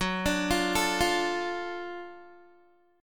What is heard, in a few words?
Gb Minor Major 7th Sharp 5th Gb down Guitar; arpeggiated